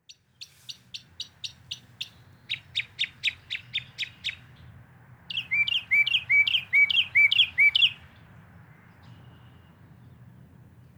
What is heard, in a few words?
Northern Mockingbird; Lincoln; Brownings; Lincoln Land Conservation Trust